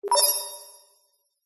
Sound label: science fiction cyberpunk robot cute beep; zapsplat